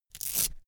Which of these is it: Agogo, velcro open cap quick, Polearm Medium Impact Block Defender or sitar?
velcro open cap quick